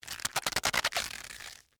Tear Rip Cabbage, Texture, Tear, Rip, Cabbage, Head, Rubbery, Vegetable; Ripping; BlastWave